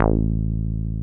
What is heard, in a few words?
303 A2 p; Drums In Your FACE!